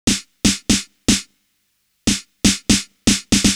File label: DRUMS, 120 BPM